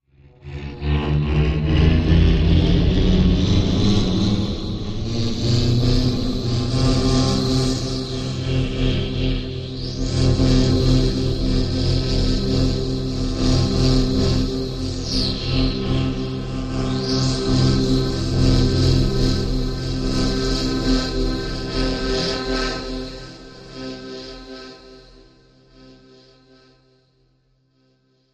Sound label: Plane Strain Machine, energy-beams